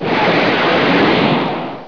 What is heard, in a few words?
FIREHIT; THEREALM